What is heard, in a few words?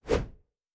Whoosh; Fantasy Sounds Bundle